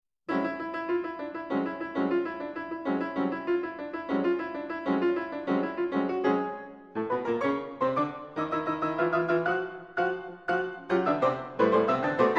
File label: Toccata